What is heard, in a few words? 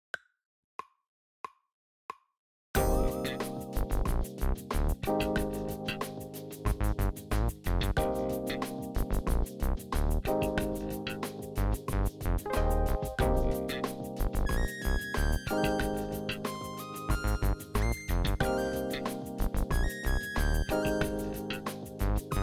drumless